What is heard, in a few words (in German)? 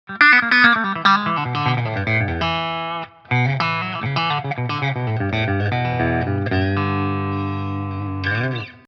Harley Benton HP 42 clean Mitte Out of Phase